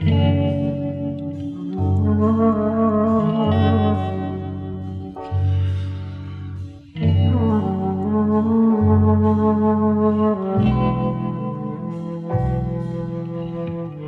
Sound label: guitar flutey